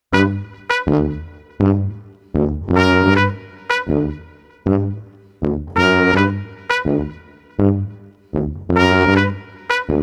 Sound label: Street Tuba Trumpet Slow Bounce 80